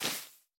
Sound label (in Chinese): 苔藓踩踏声